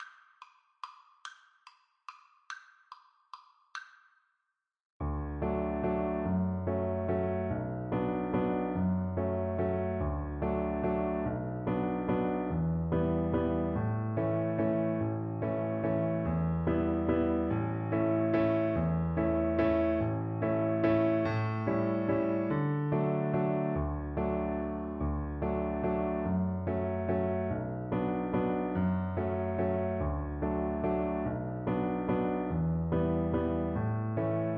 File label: cello